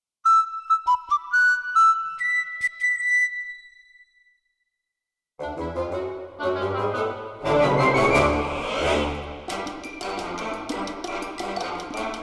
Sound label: Ocarina Song, Mambo, orchestral arrangement; the legend of zelda links awakening orchestral arrangement 2015